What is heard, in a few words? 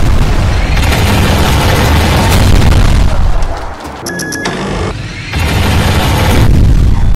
klaxon beat